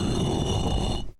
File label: click